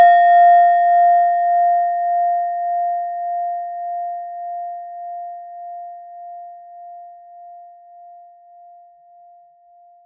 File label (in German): klangschale nepal, Klangschalen Nepal